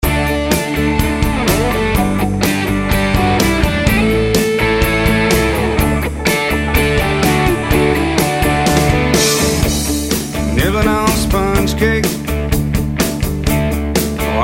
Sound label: SOLO EL GUIT